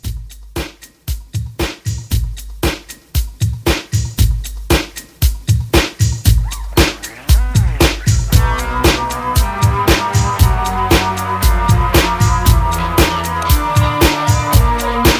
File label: Halloween Re Drum 116 CLEAN